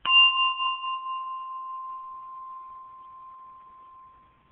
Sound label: bell; pooh